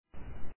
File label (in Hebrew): צליל קצר